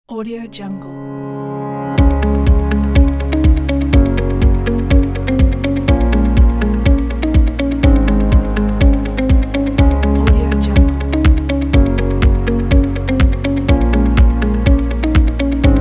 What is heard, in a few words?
uplifting corporate